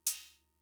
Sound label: Cymbal, Fricke MFB-522, Drum Machines